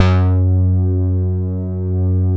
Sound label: F#3 ANA BS; Synclavier Sampler Library; KEYS AND GUITARS